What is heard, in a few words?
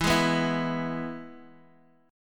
E Major guitar chord, DADGAD